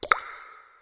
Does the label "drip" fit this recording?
Yes